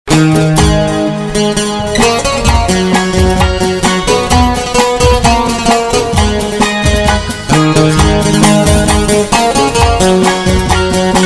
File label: Instrumental